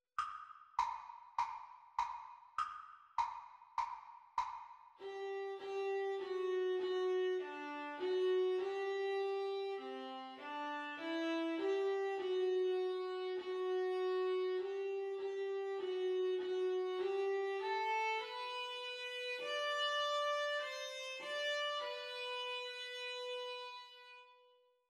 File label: Viola Duet Playalong Exam Speed